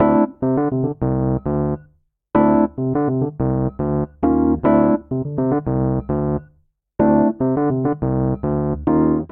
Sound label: Vamp Upbeat Jazz Fusion D Minor 103 bpm, Electric Keyboards; Ableton; Factory Packs